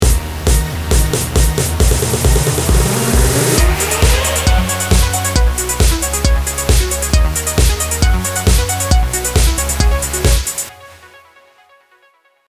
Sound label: drum EDM